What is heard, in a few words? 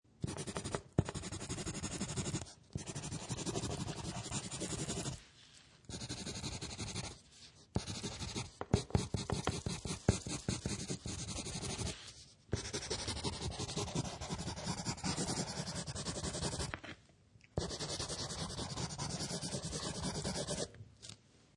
Writing On Paper With Pencil; Writing, write on paper or draw on paper with pencil